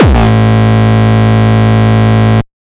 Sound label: pretty solid kick actuallyy 200 A